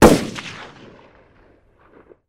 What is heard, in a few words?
Gun Shot Sngl Shot Ex